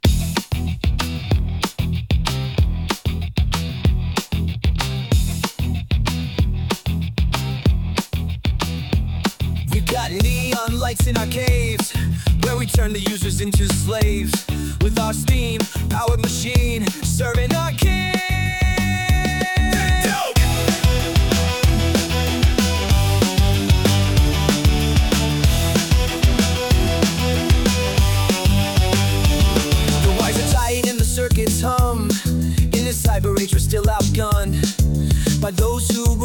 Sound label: Breakbeat; Future Land